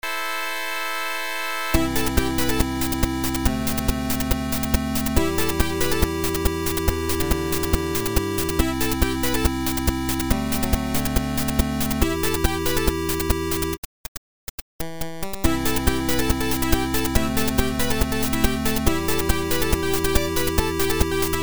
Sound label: 8bit mix